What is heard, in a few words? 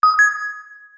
product scanned; Business skieme Beta 1